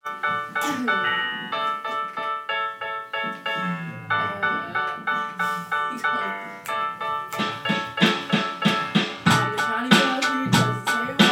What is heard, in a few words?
intro verse drums